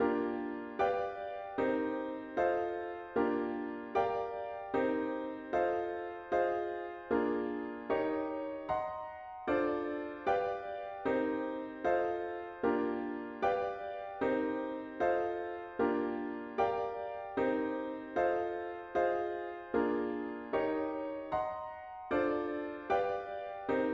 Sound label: chords only